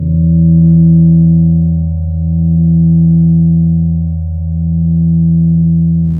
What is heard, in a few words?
Distorted Bass Drone